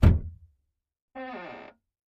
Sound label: Ford LTD hood and trunk open and close. Vehicles, Sedan Sedan, Car Open, Close